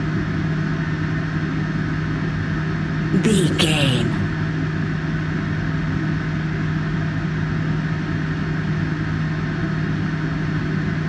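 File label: background air vent vacuum hum motor loop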